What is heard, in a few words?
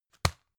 tissue package drop hard